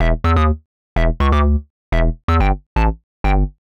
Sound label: Hard Synth Bass E, 125; PowerFX - Nu Jazz House